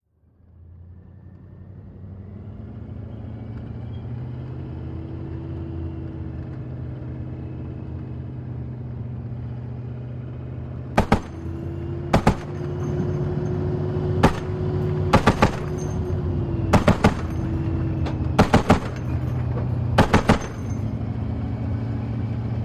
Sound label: Apc: Interior Driving And Firing. 50 Caliber Machine Gun. Dual